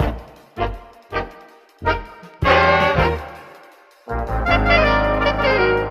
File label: horns, loops, phonky